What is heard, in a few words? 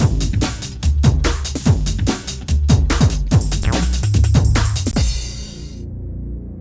Hardcore Breakbeat, 145 Bbmin; Big Fish Audio; Hardcore Breakbeat Action; Suspicious